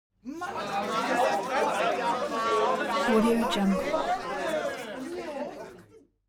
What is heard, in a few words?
human crowd male crowd grumbling short; smartsoundfx